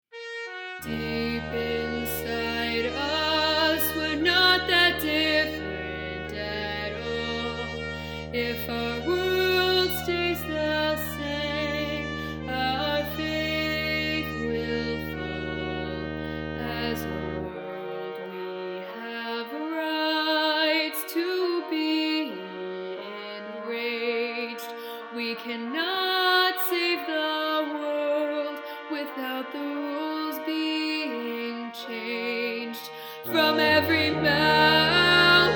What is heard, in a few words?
one voice